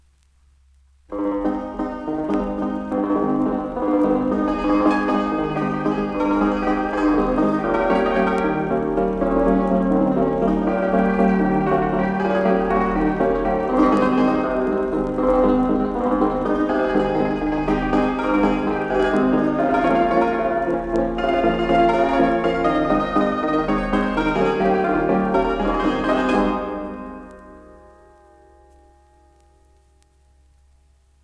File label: Piano melodico